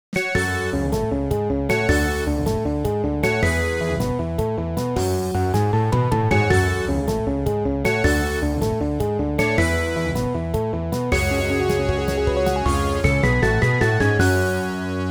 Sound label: Gameover Retro